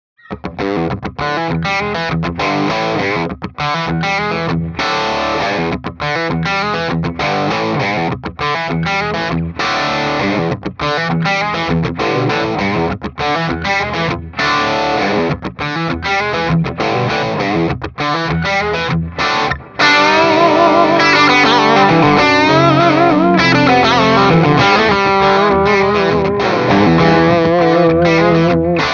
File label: Coyote midcut; mojave